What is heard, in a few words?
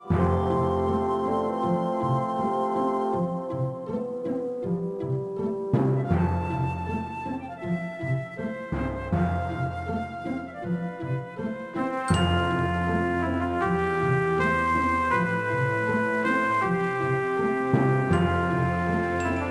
Musicloop; Pokémon Masters Arena